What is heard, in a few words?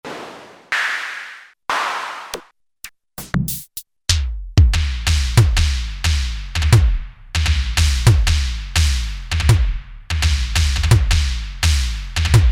Analog; DRM-Analog; Roland L-CDX-01 Rhythm Section Instruments